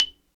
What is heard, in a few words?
XYLO, Afro Xylophn